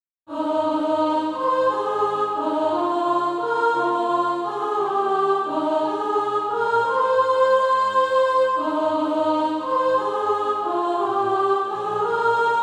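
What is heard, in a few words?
soprano, SATB Practise Music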